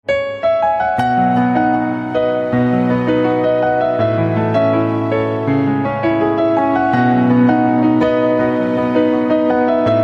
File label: Piano, instrumental ringtones